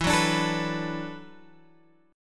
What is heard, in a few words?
E 7th Suspended 2nd Suspended 4th, down, Guitar, Open F; E7sus2sus4; strummed